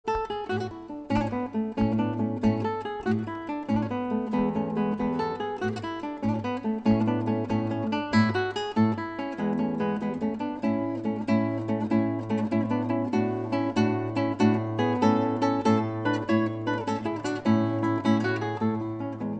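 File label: classical guitar solo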